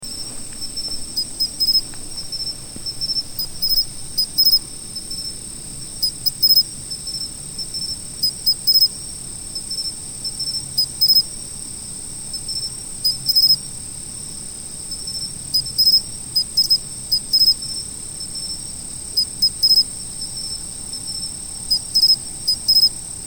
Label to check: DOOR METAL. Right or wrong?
Wrong